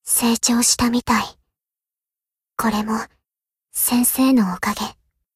applause

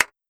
Small Clap C

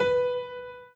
Piano Chord

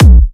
soft hit whistle

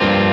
F#m7(11)

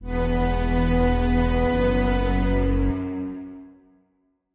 Strings

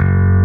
bass

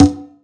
conga hi

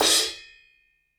Crash short; osu

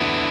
D6add9

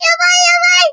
combo break; osu

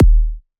house kick; osu

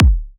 kick; osu

